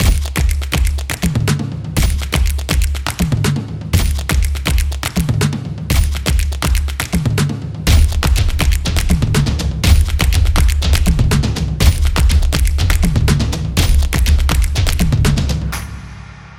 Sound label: The Drum